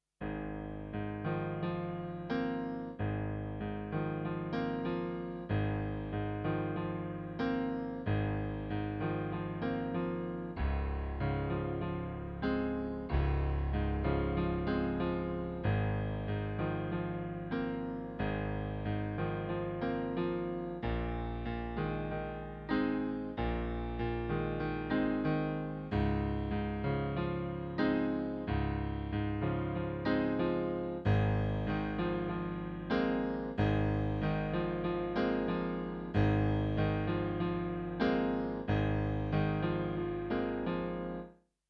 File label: sweet asia piano